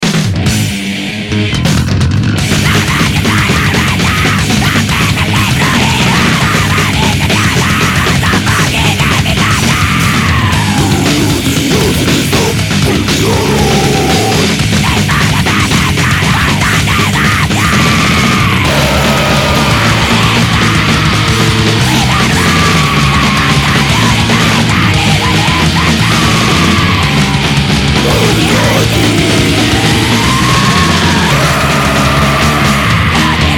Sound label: guitarron